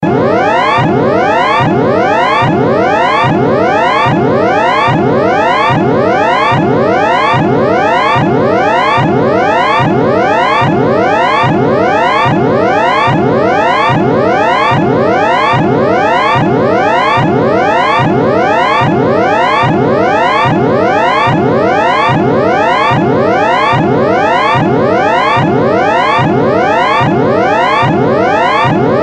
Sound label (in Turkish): Alarm Sesleri